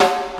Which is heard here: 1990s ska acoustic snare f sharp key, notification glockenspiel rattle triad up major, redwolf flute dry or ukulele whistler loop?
1990s ska acoustic snare f sharp key